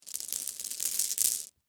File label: Marbles Set Down, Texture, Marbles, Set, Down, Handful, Slow; Miscellaneous Toy Sound Effects; BlastWave